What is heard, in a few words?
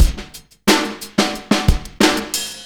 drums no reverb